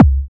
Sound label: BD, Dance Mega Drums; Best Service